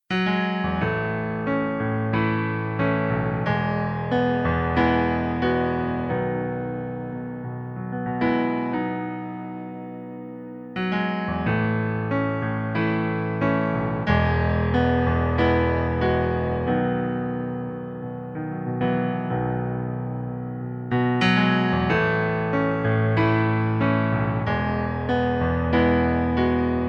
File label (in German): Pianoplayback H-Dur